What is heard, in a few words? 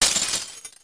Broken glass; RedDot